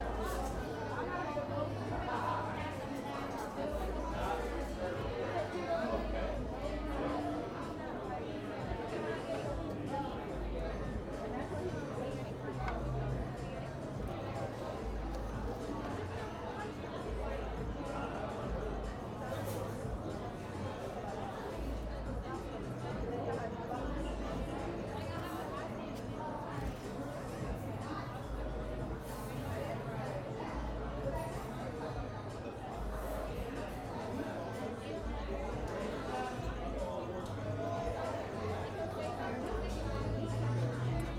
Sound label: Bar Patio Ambience Walla Soft Music Just Beats